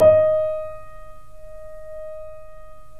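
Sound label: D#5, Bösendorfer, 3D Pianos; E-MU Producer Series Vol. 5; 3-D Audio Collection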